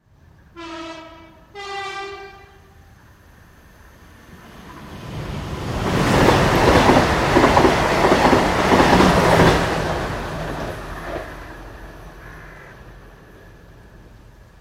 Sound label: Train Electric Up and Pass with Hooter; Train hoots then passes close